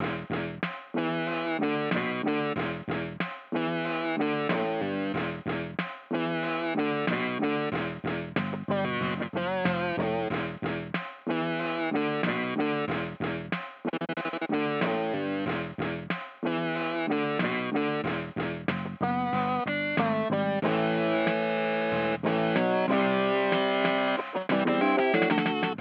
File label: Rock, Aircheck, Phono